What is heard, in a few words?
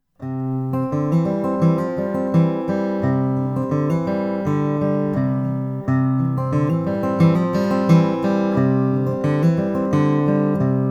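1999 froggy bottom h 12 deluxe indian rosewood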